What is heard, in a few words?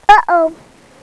error